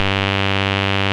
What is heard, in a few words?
rave beep G1; Best Service Alpha Dance vol.2